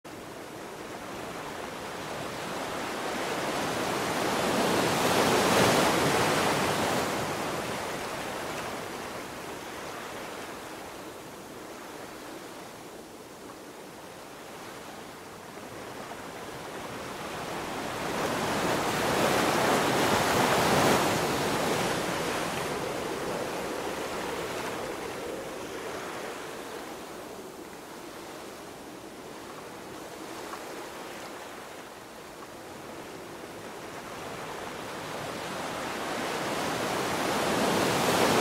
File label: Sounds of the Cliffs Waves